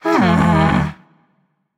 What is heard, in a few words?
sigh; smite; hunbatz